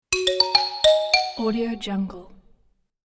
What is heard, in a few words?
xylophone logo